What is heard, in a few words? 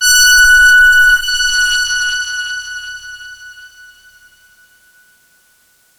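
big brass F#3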